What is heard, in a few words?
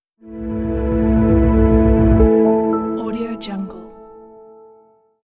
soft piano logo